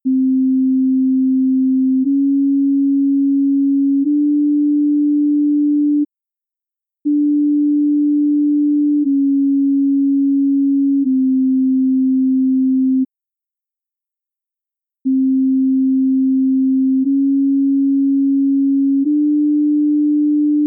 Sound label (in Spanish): semitonos